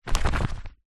animals bird flap wings short on ground

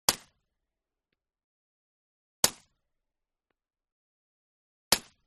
Gun Shot Silencer; WEAPONS

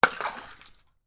impacts glass bottle smash liquid inside; martinimeniscus

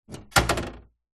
household popcorn maker machine plastic flap open

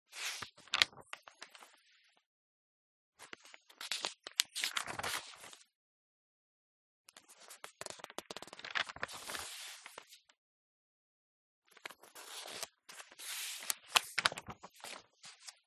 Newspaper Turning Pages Handling; PMSFX

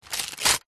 foley paper bag small thin rip tear; zapsplat

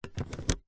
car 12 volt usb convertor plug in; zapsplat

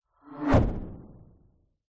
science fiction ultra fast pass by whoosh; zapsplat

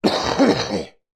human male with bad flu or cold chesty cough

